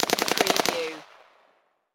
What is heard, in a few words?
Machine Gun Colt AR-15 Long Burst Distant Range